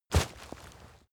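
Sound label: step leaves wet heavy, footsteps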